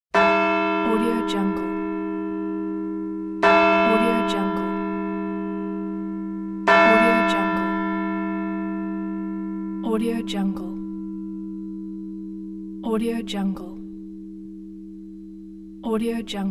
church bell new england; hollywoodedge

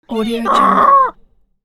donkey

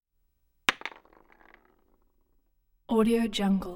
ammo rounds drop; prosoundfx

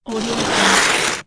ice scrape; electronplanet

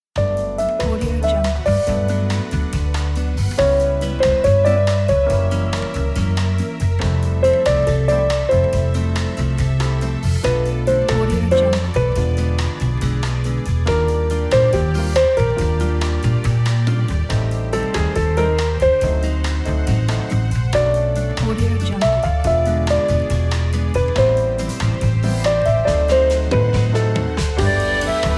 elevator; korolkov

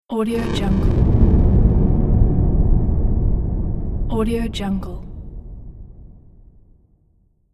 underwater game mine explosion

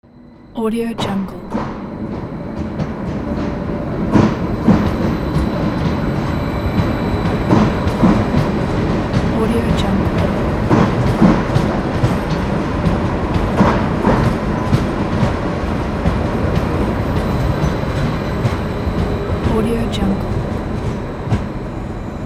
train leaving station

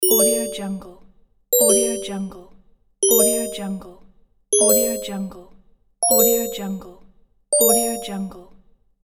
simple bright alert

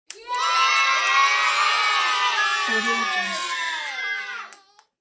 children cheering yay

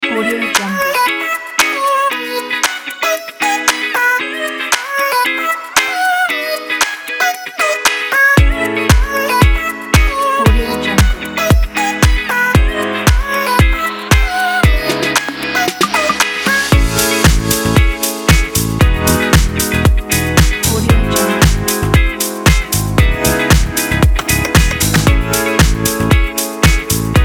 lounge house corporate

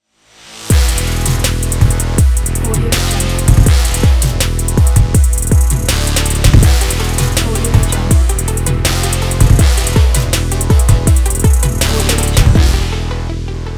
trap urban logo

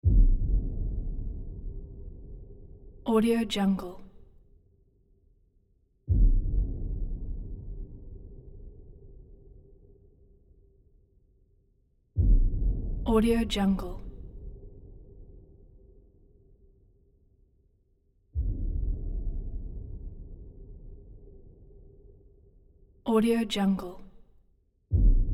evil pulse heartbeat like throbs deep impact

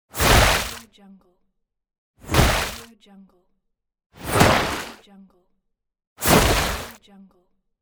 water short spell

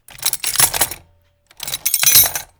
metal shuffle big machine reload; mechanism source; soundmorph

